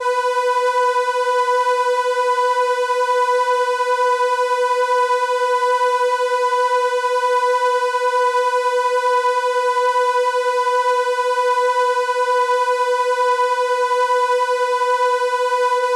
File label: Viola B5, ARP Solina